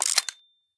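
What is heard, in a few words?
steampunk movement landing; smite; serqet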